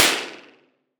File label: lobby; ccrma